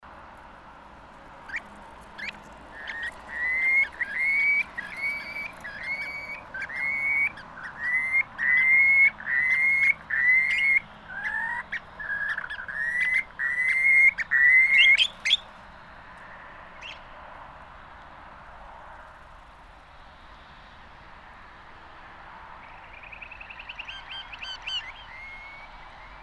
Skarsnappa

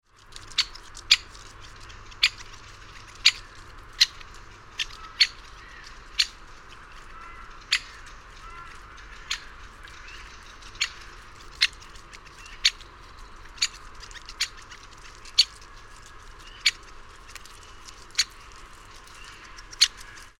Balkanspett

